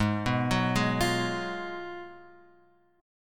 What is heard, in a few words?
G sharp Minor 13th G sharp, guitar chord; G#m13 Chord {4 2 4 1 X 1} arpeggiated